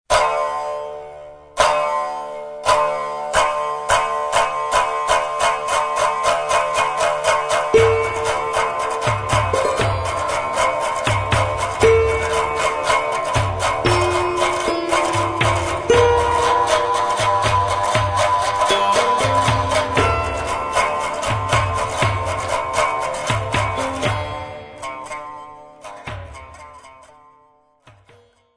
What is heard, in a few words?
Twang